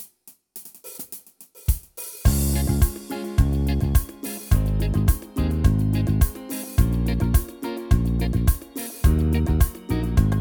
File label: style entertainer caribbean, yamaha tyros3